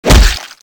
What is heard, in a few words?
sword off hit; weapons